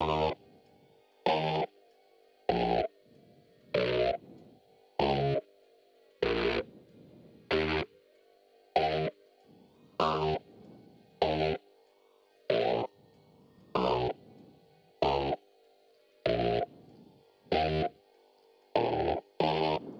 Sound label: Bass Verse